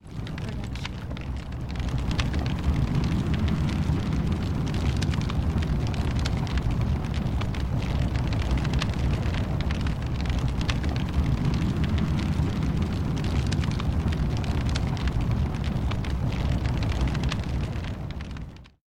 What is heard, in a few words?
fire